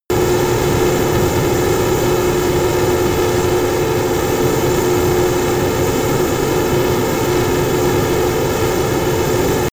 military jet fa-18 hornet turbine full power close